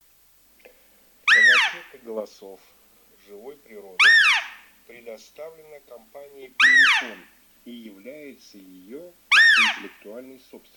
Косуля самка Capriolo piano; plurifon

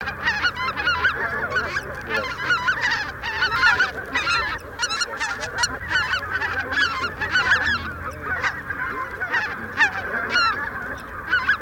Белолобый базар Oche Lombardelle; chip mini gusiniy 5B plurifon

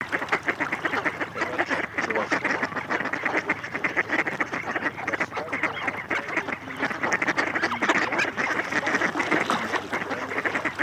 Кряква Germano; plurifon

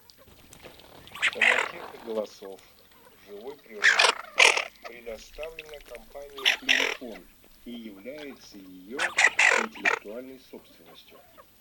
Хохлатая чернеть Moretta; plurifon

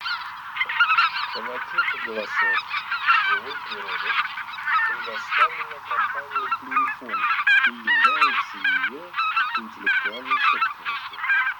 Белолобый базар Oche Lombardelle

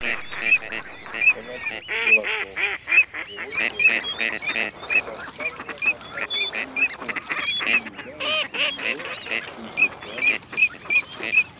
Чирок-свистунок Alzavola Серая утка Canapiglia; plurifon

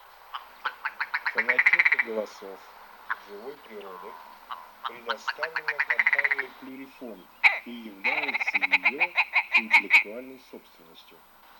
Куропатка белая Pernice Blanca

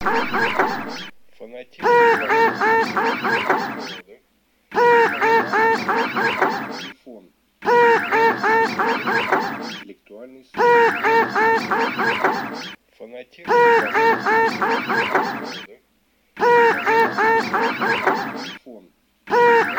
Шилохвость Codone; plurifon

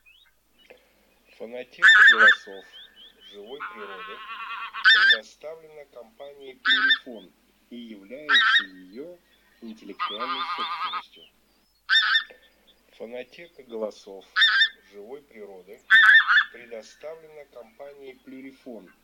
Гуменник Oca Granaiola; plurifon

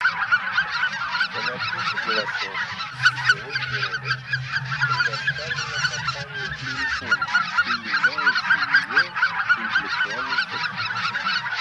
Белолобый базар Oche Lombardelle

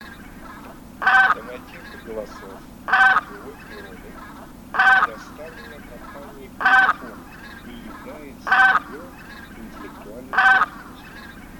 Гуменник Oca Granaiola; plurifon

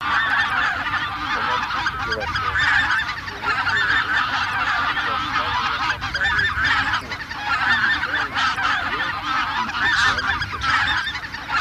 Гуменник базар Oche Granaiolle; plurifon

